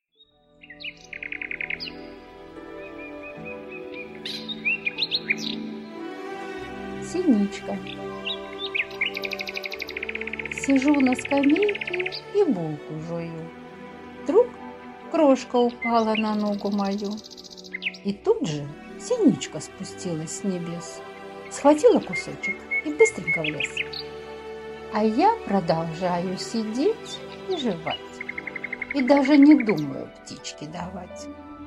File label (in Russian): Пение птиц; Синичка